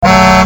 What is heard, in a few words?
firetruck horn; garrysmod